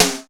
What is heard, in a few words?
rim, drums; East West; Global Sounds; RY30